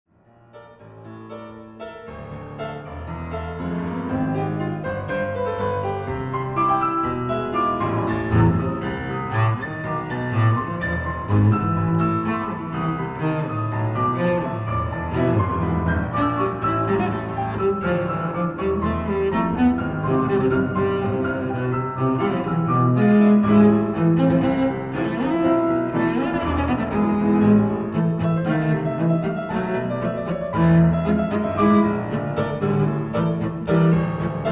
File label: Tango